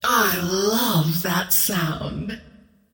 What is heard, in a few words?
attack whip; queenofpain